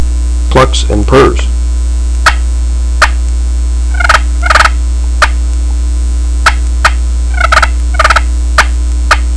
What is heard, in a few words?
slate clucks purrs, turkey; Southland